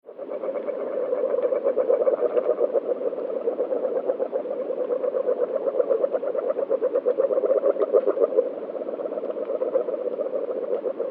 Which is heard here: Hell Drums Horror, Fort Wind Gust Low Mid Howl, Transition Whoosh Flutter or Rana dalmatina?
Rana dalmatina